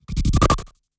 Strobe Transition

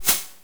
Slider

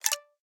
Message

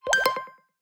Close Voice Assistant

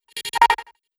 Strobe Transition

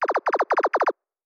Data trill

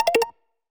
Notification

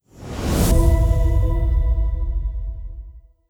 Simple Transition

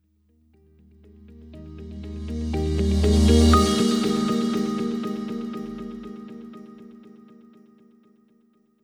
Corporate Transition

